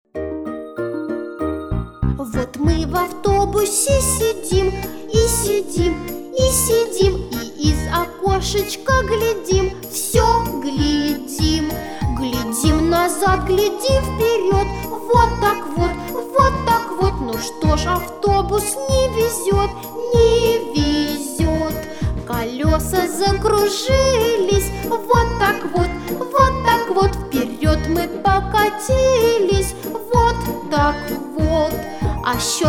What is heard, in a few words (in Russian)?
автобус